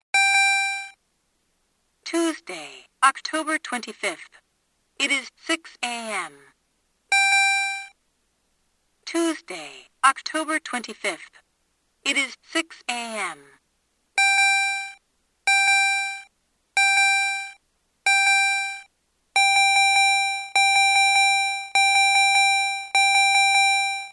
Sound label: Alarm